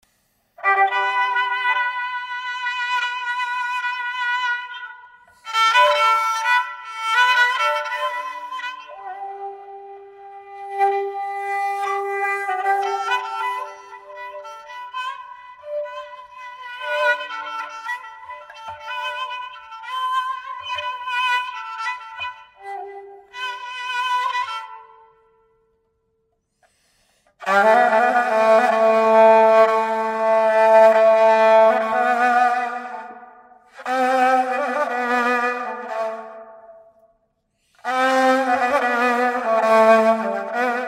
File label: kamanche